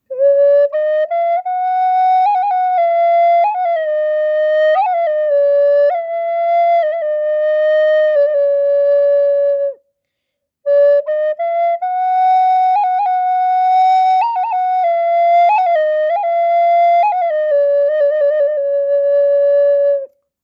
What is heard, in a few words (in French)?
flute lune d d f f g a